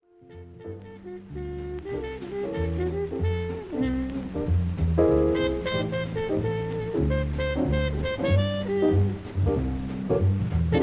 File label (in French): musique